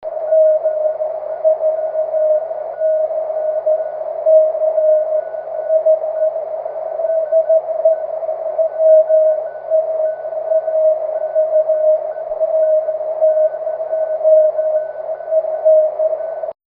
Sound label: cw; 23cm